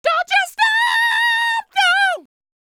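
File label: falsetto, R&B Dance; Spectrasonics Vocal Planet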